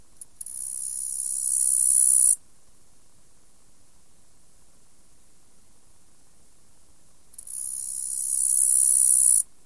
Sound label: Orchelimum vulgare; Arthropoda